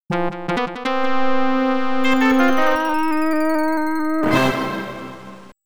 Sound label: All Clear; Cynthia 1992 version; X68000